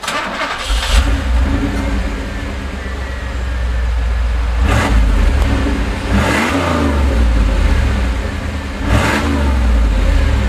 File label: muffler normal